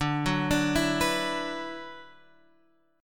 D 6th Add 9th D

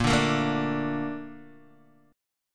Bb Major 7th, Bb, down, Guitar, DADGAD

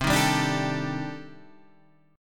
C Minor 6th Add 9th C; Cm6add9 Chord {8 6 5 7 8 5} strummed

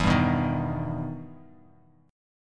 E Minor Major 7th E down Bass Standard; EmM7 strummed

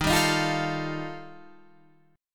G 7th Suspended 2nd Sharp 5th D sharp

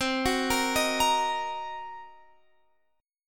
C Minor 7th Flat 5th, chord

